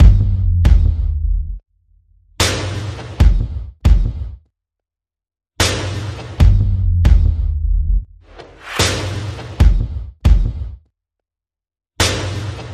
Perc and FX

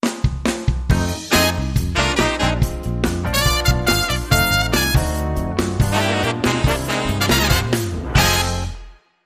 Funk Brass, Jingles

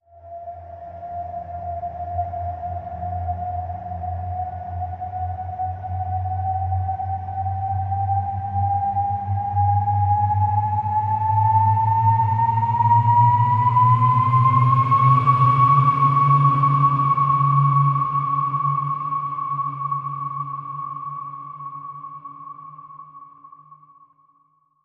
Breathless Whistle Rise

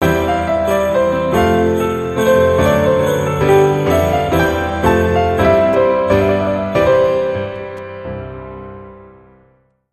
Twelve Days of Christmas

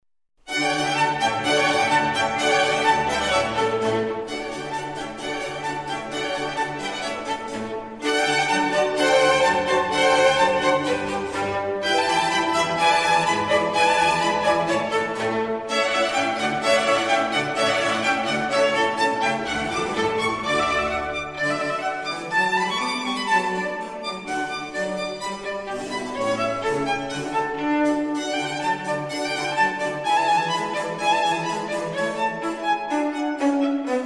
Concerto for violin in D major; Antonio Vivaldi

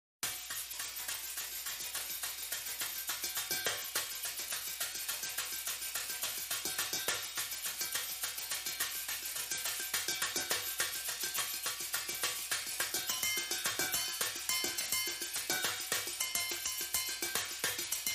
High Drums

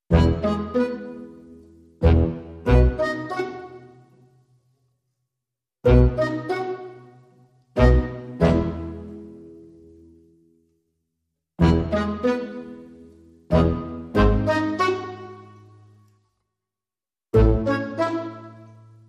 Electronic Drums

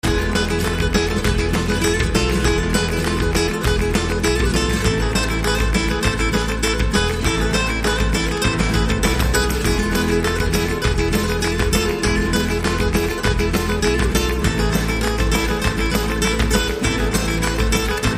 Indie Flamenco

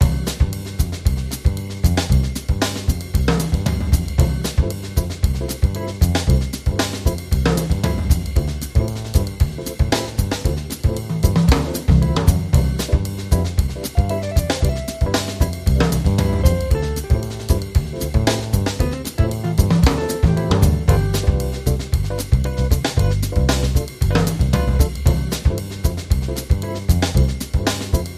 Sixties Cocktail Lounge, Underscore No Flute

